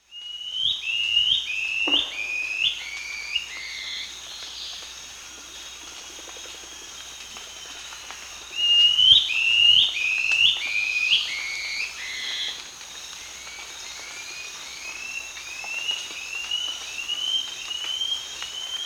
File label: White-throated Antbird (Gymnopithys salvini), Peru; S. America